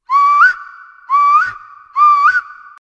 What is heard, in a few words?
Weka; Te Ao o Taonga Puoro